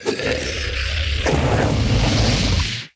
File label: Hellhound Spattack; Puzzle Chronicles; PS3; PSP; X360